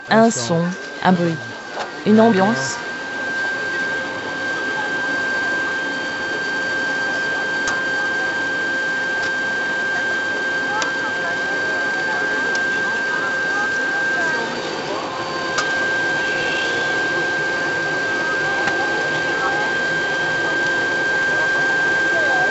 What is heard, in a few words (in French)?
Un son un bruit une ambiance; Les oreilles du monde